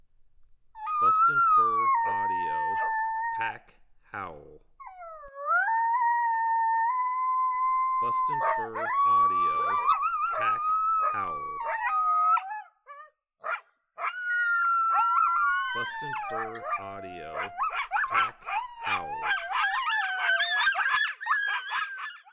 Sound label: Pack Howl